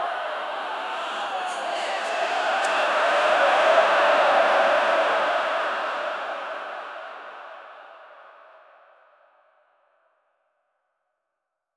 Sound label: crowd panic; commotion